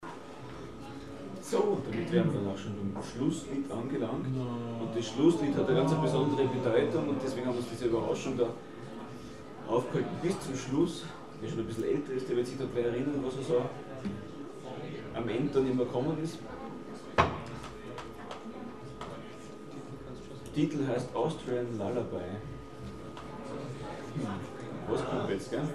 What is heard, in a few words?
austrian lullaby